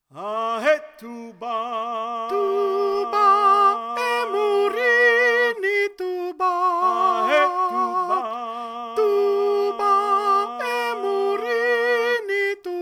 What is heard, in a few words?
tuba soprano